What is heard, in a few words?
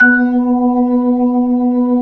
B-3 Jazz; Keyboards of The 60's and 70's